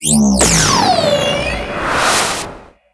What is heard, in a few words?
Rocket; MagicItem; Cutscene